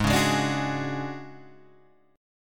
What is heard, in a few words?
D Major 7th Flat 5th G sharp, chord